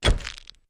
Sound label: Flash Bulb Pops Poofs